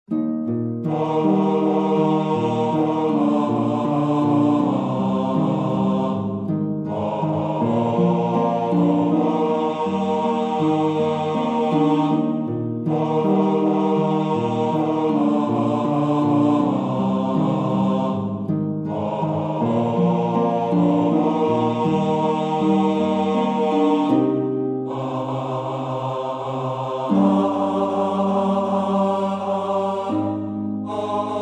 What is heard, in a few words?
Bass